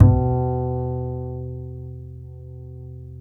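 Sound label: DB C3, double bass; Balkanish Traditions; USB Soundscan vol.63; AKAI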